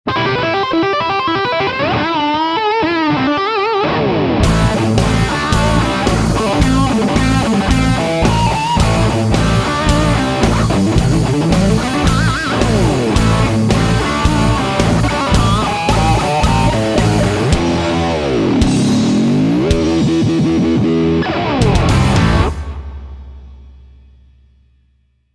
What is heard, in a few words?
Brown Sound Electro-Voice EVM12L Black Label